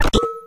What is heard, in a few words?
ui coop hud click; Source Engine; Portal 2